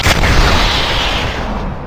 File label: attack; Xerath